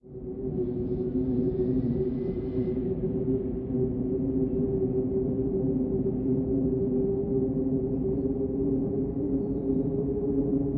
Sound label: eerie wind; Ace Lightning; Windows